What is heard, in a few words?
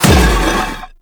Shield Scrape; Warframe; Melee; Whooshes